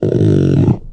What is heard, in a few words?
warn